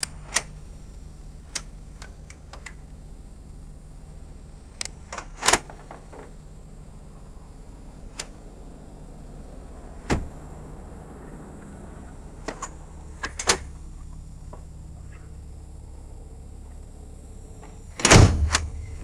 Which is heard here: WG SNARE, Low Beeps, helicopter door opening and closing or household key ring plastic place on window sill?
helicopter door opening and closing